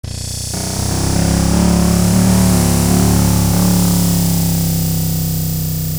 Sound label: drummix